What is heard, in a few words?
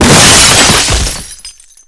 explosion television shot; Explosions; Call of Duty Modern Warfare